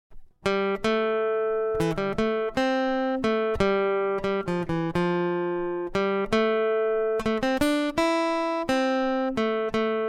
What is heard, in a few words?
Aural Rhythm Test, acoustic guitar; grade 8